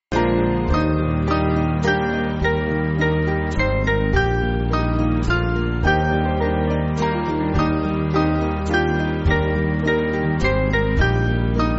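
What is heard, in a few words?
Band, Music Backing Files for Churches; I Love Thee; Jeremiah Ingalls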